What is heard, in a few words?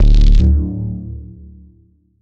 rad pulse